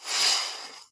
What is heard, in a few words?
curtain close